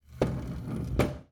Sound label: classroom desk draw